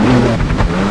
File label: jetski bounce; hl2